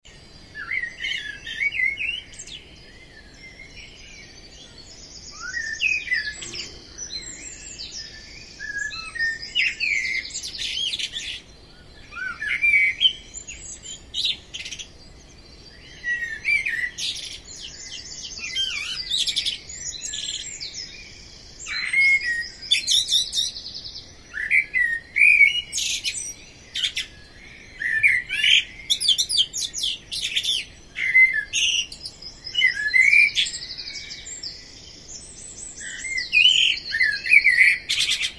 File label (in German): Amsel singt